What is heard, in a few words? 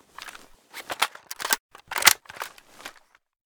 sig550 reload